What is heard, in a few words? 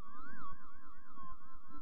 ambient whale loop; stompfx